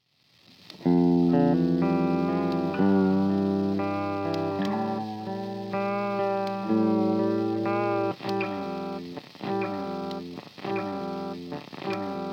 VINYL, lo-fi

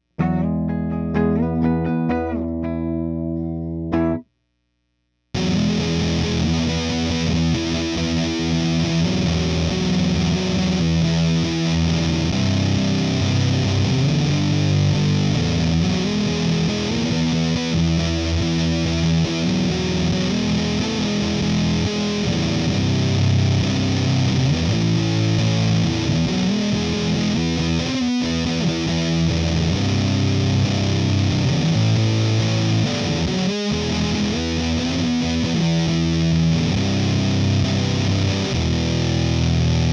Fuzz YES set low; earthquaker devices

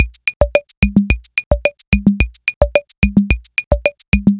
Mambo

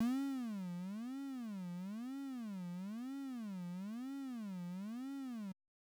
LFO Pitch Mod

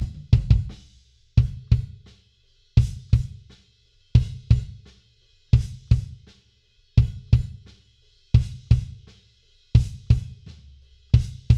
Kick Group Dry

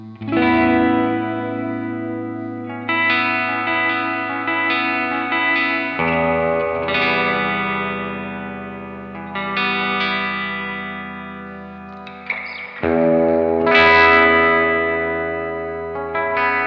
reverb

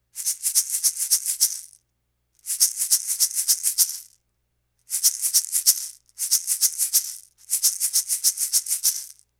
Shure SM57 Shaker

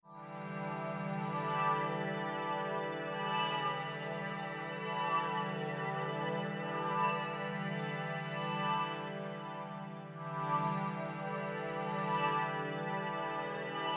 SLIDE

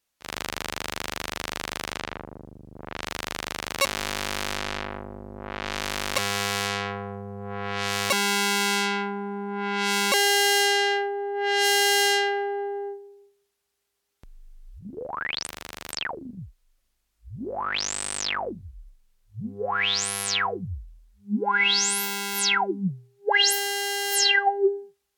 hipass

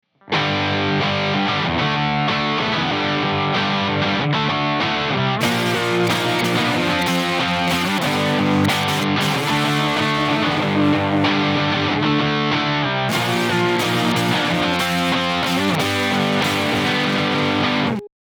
Rhythm and Lead

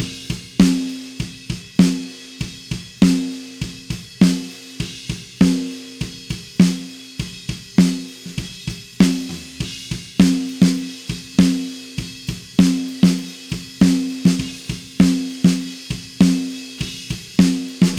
Snare top and bot

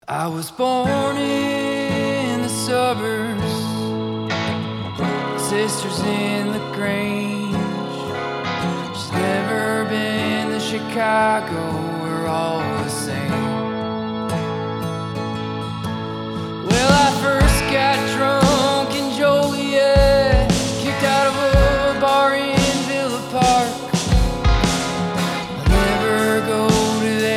full track verb

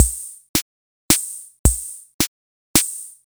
Waltz A

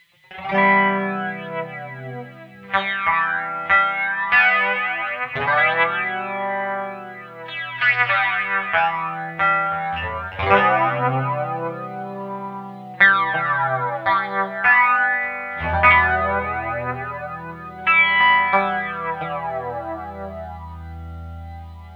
mod no delay

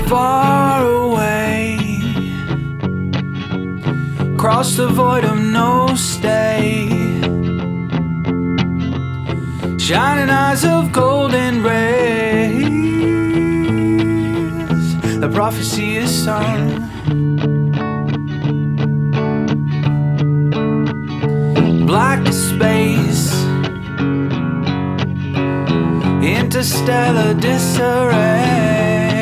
no verb

